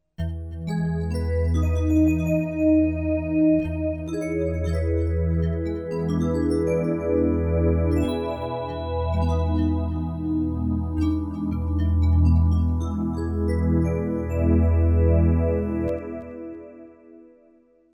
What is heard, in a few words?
Vibes Pad